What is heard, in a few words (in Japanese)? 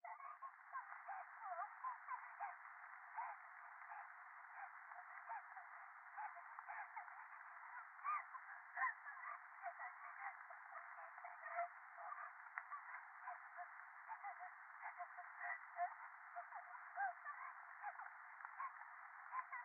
電話 可愛い喘ぎ 雨